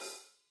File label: hi hat 14 inch slightly open; soundscrate